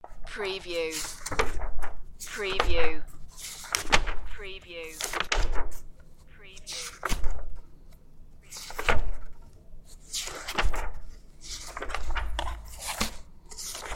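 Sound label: Flipping Paper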